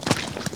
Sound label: step; wob2 demo